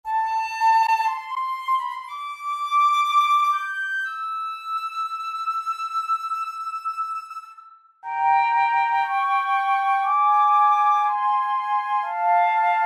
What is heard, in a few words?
night of the flutes